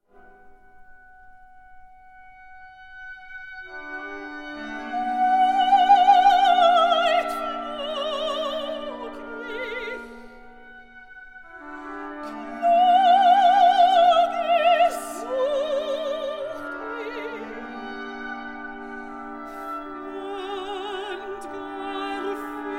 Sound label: Gurrelieder for soloists chorus and orchestra